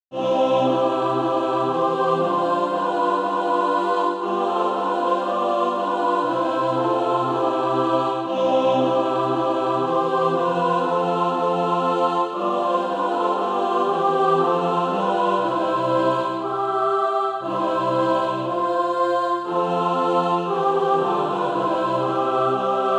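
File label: mixed, SATB Practise Music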